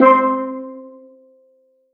Roland GR-1 Steel Drum C4

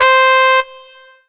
Korg 01W Trumpet C5

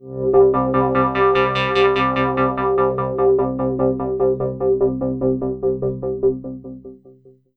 Kawai K3 Pulsating Pad C2

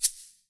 Korg TR Rack Standard Kit Shaker

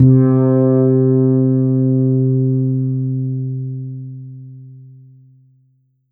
Korg TR Rack Fretless Bass C3

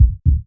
Heartbeat

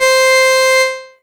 Korg DW 8000 Lead C5

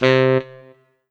Yamaha TG500 WN Tenor C3

